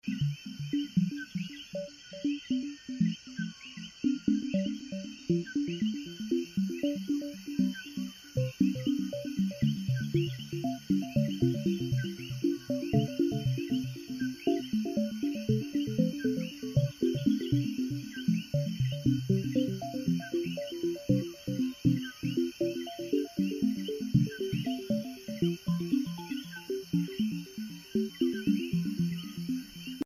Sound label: Fairy Estuary 6144Hz